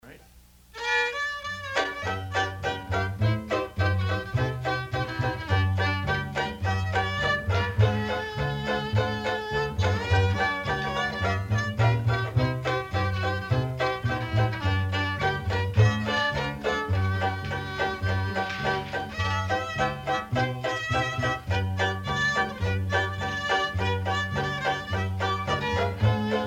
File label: violin session